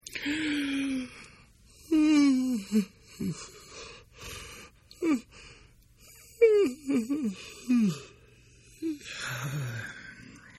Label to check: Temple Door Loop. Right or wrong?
Wrong